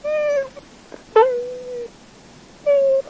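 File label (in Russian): унылый собачий писк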